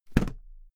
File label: briefcase drop close; industrie wirtschaft; bueroarbeit